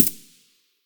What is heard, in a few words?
aug silencer mono far, weapons; STALKER; IWP